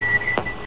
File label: Motion Tracker Start